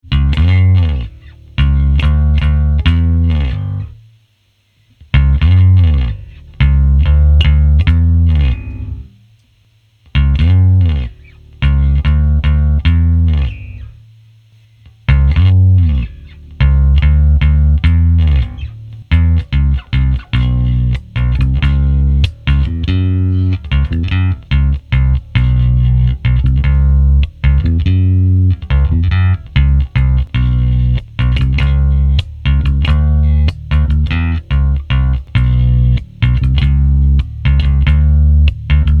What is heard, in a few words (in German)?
Aria Pro 2 Integra passiv aktiv; Made in Japan; Matsumoku; prototypen